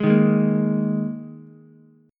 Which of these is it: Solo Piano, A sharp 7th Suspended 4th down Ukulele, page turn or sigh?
A sharp 7th Suspended 4th down Ukulele